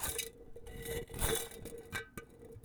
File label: Small Can - Roll on Tile; Micro Pack